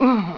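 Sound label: GRUNT; spank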